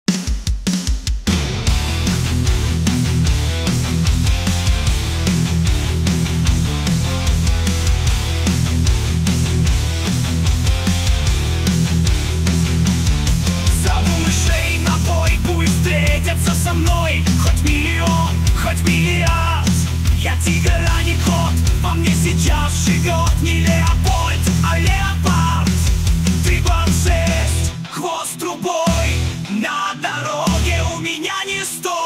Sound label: metal cover; кота леопольда; suno ai